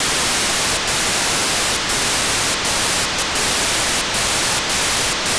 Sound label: Netsat 435MHz600 9k6 FSK beacon, satellite; Netsat 2; On November 27th 2022 at 12:30 UTC Daniel DL7NDR received on 435.600 MHz the 9600bd FSK be